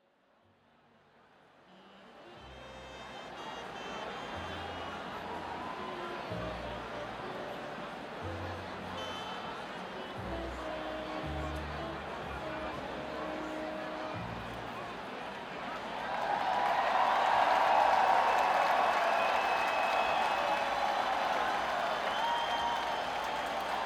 Crowd, Saratoga Performing Arts Center; Saratoga Springs, NY

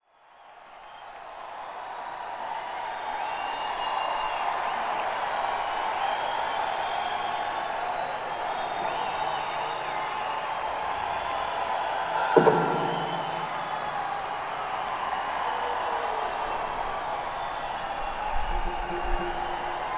Crowd; Canandaigua, NY; Finger Lakes Performing Arts Center

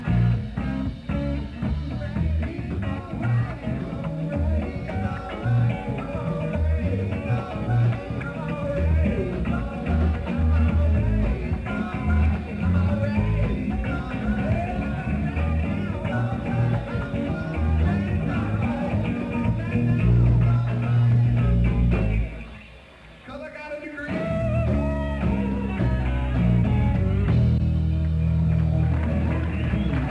blues; Burlington, VT; Hunts

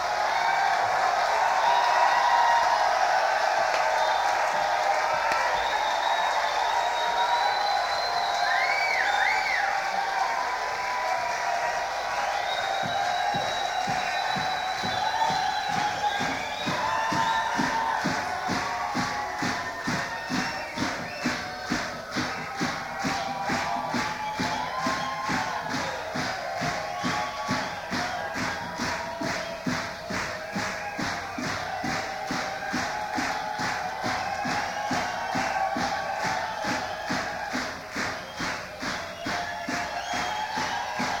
Crowd; Portland, OR; Roseland Theatre

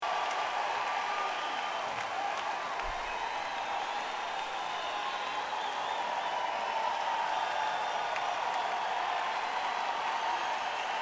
crowd

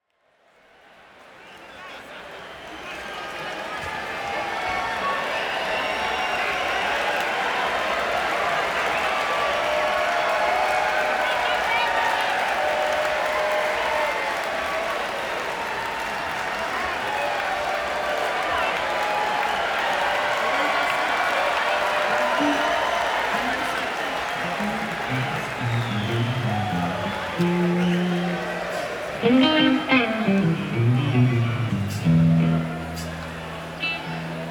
Crowd; Bethel, NY - Bethel Woods Center for the Arts

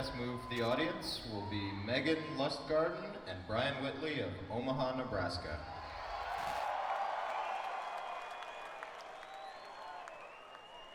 audience chess move

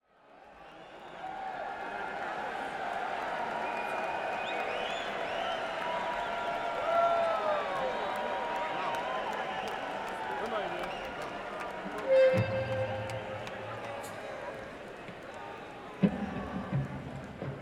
Crowd; Dayton, OH - Nutter Center

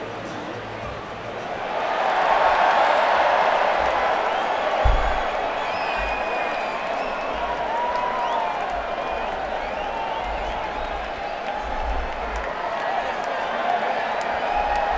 Crowd, Madison Square Garden; New York, NY

